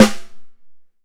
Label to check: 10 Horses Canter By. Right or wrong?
Wrong